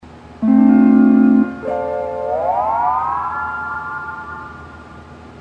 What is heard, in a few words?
hawaiian piece